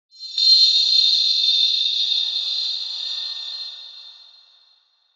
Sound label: cell Damage; Leucocyte; CARRIER